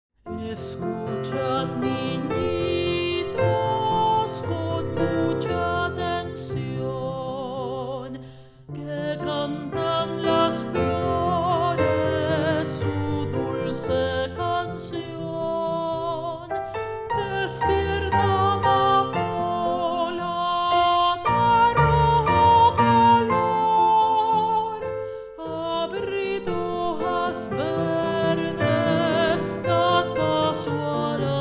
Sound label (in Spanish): La marcha de las flores segunda voz